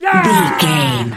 voice male battle shout short

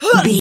voice female hurt pain low

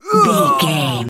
voice male grunt pain death

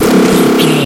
retro damage hurt ouch

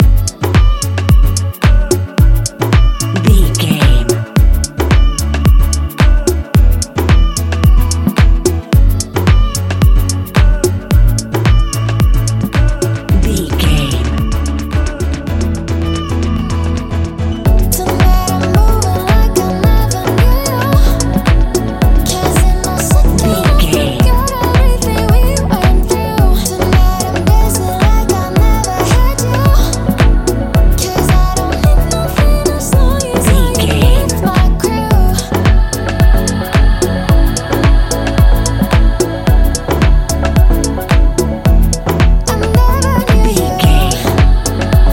A fast paced piece of club music; A fast paced piece of club music perfect for the nightclub, and a youth orientated visual scene.

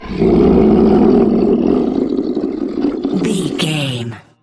troll monster growl long

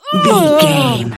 voice female death

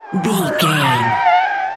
tyre skid

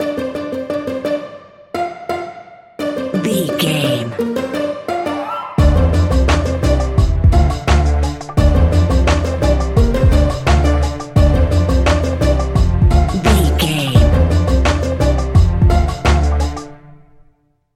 music fun funky mushroom

